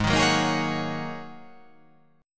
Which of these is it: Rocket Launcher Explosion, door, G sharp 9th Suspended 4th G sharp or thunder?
G sharp 9th Suspended 4th G sharp